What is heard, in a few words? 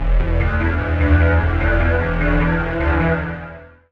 Forest Synth Bass